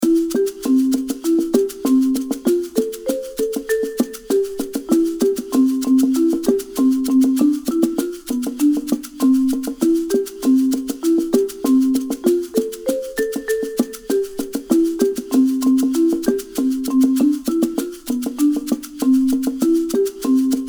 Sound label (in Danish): Fastelavn er mit navn minus vokal; Sangbank